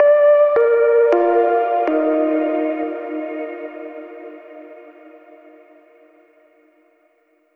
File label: Overlay Tone